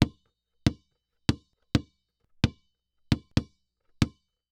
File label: Sports Basketball Dribble On Hardwood Floor With Double Dribble